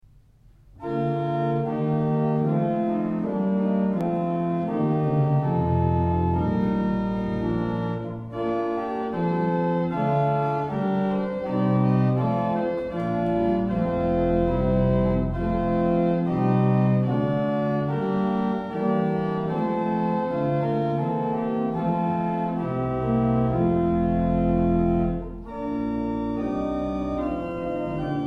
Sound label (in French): piece pour orgue